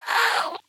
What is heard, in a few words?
Female zombie; Zomboid 41.78.16; Project Zomboid